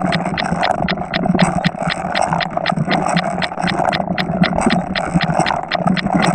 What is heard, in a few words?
Cogs